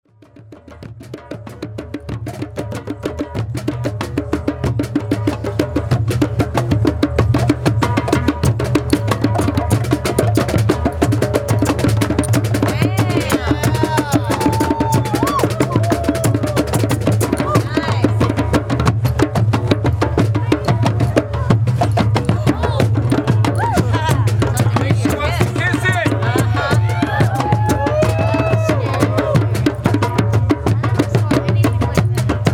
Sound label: Fire Drums; Rainbow Family; Washington 2011